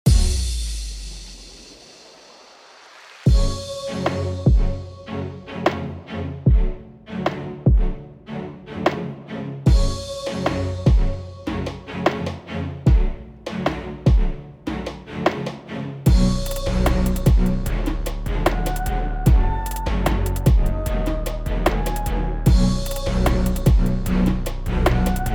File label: Classical EDM Record; Sun Haven